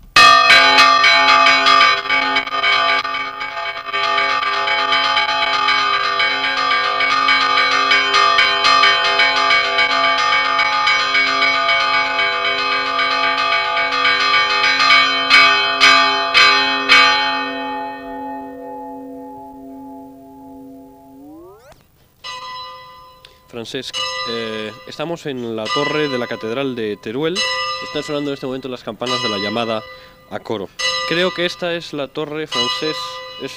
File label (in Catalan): campana